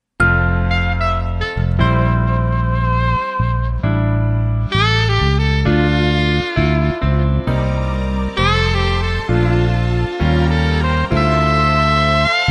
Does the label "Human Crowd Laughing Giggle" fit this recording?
No